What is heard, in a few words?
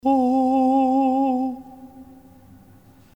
echo, Cherbourg St Trinite; Holiday 2001 in France